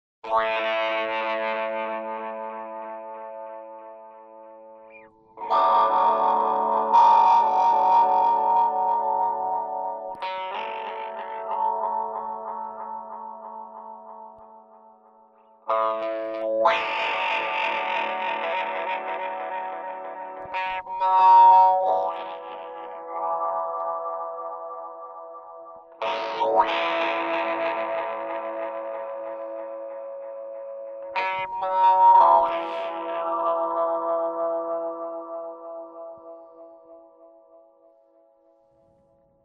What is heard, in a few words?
Talk Box Right